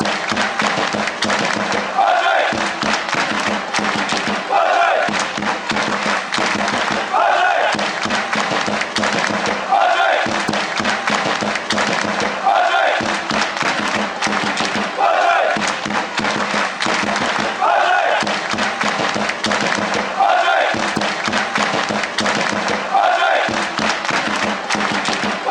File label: patrick clap fanchants